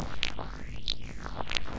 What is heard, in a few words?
microscopic dust monster loop; LOOPING AMBIENCE